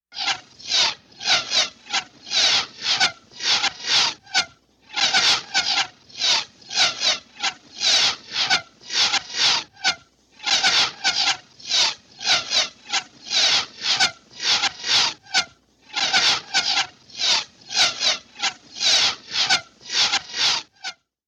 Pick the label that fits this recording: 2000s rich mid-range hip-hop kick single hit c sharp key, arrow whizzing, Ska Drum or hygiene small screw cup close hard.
arrow whizzing